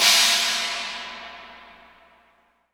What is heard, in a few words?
IMP CYM CRASH, Cinematic Impact; M-Audio Pro Sessions; Liquid Cinema; Drum